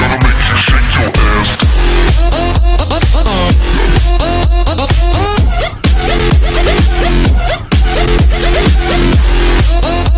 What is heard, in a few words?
electro; roundsound